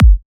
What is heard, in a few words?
Kick; Ultimate Kick Collection